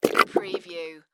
Mud Bubbles

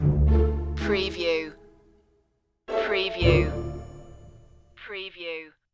pondering stinger

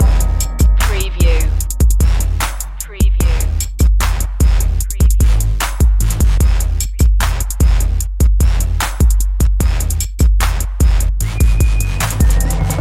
bad ass hip hop loop

